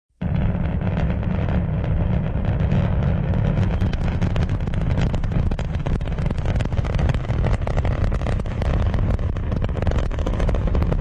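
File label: shuttle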